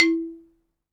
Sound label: XYLOPHONE, Percussion; ILIO